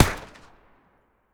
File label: MP40 Single Shot; Jagged Alliance 3